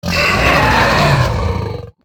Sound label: Cave Hydra Death